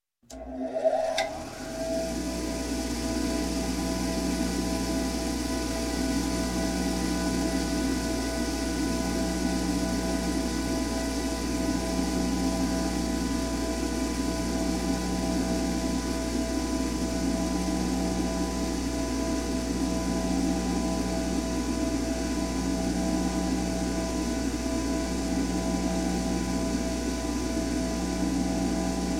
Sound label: Construction, Grinder Start, Run, Stop; Sound Ideas 2000 Series